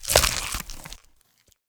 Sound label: gibbing